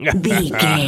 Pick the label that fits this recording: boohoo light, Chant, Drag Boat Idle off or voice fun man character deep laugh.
voice fun man character deep laugh